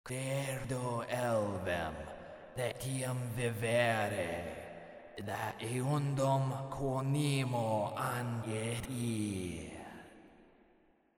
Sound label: chant; Dungeons & Dragons official roleplaying soundtrack